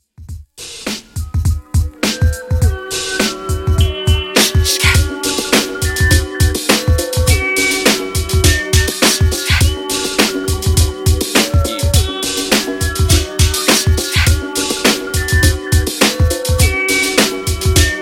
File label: Old School 103 Bpm; Redum